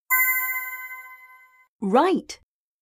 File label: Correct